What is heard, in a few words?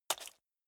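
step water single left, footsteps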